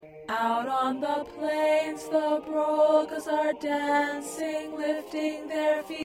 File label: Alto; Carol Of The Birds; holiday songs